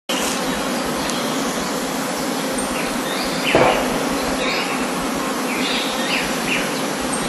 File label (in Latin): Chiroxiphia boliviana; PN Calilegua; Jorge La Grotteria